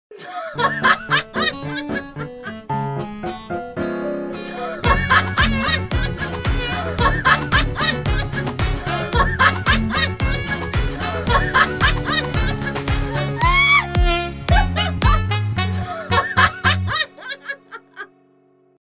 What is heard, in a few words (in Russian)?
muzyka s zhenskim smehom